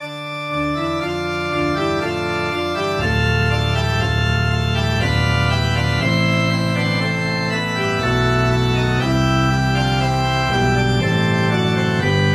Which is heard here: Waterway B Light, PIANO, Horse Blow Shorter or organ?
organ